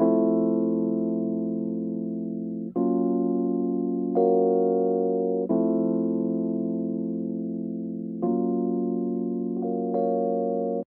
rho D min, Hip Hop & RnB; ProSamples vol.34; Sweet Jasmin

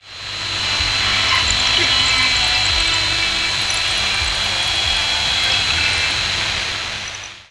SHORTWAVE MELT; Sonic Foundry (Sony Creative Software); Vir Unis Infusion

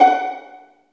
Vlns Pizz; Roland L-CD702; Vls Pizz

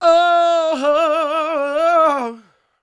SING, Vocal Planet Blues & Soul; CW SOUL

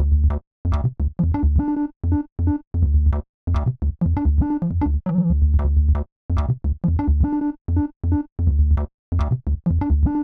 curious, Loops; Polyend; Loops n trouble

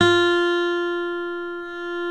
6 STRING GTR; OMI - Universe of Sounds

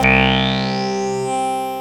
SYN SYN DI, SYN DIGITAL; Sound & Vision - Gigapack I CD 2

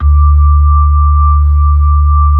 B-3, Hollow

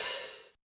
Yamaha DJX 2B, Hi-Hat Open

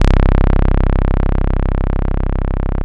RAW SAW, BASSES

